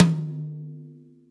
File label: Tom